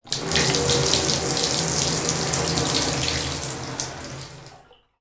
toy water poured from plastic toy watering can into empty metal bathtub